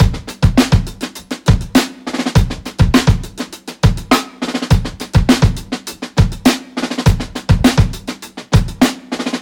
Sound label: 102 bpm drum loop g key, Free breakbeat - kick tuned to the G note. Loudest frequency: 998Hz